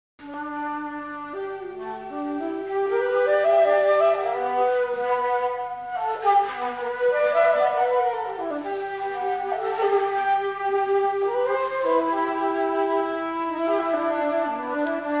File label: alto shakulute duet